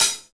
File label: Folk Closed HiHat